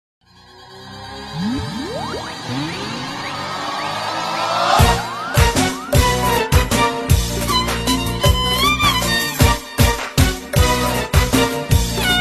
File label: wedding song; ورود عروس داماد